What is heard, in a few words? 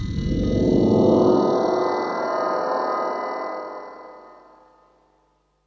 ASR10 Phase wave